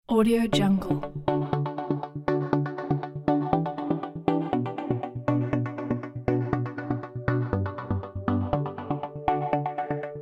corporate uplifting

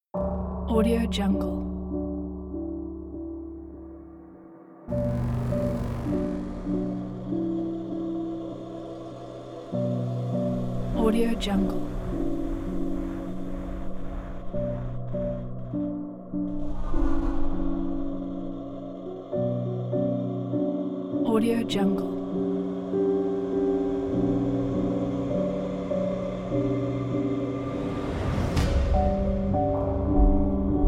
sadness is

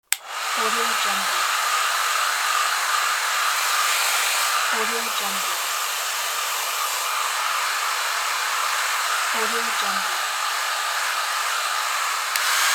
hair dryer

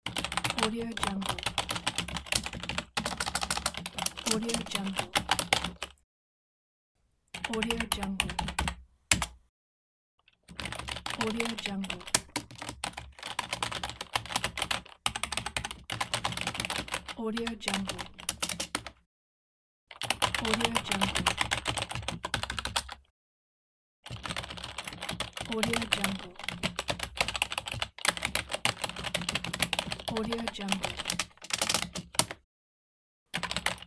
keyboard typing; warpjumper

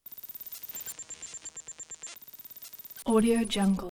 beeps; prosoundfx

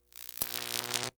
electric arcing

